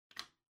Light Switch-American Toggle (Wall-Gentle)

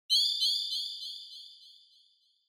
Onoma Flash (High Delay); オノマトペ 光る; 高 ディレイ

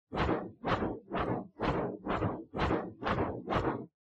Uchiwa Fan

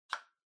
Kitchen Timer Magnet Remove

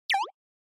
Anime Motion Low

